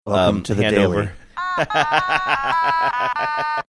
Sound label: welcome to the daily playing tune otamatone